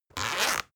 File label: zipper open; objekte; reissverschluss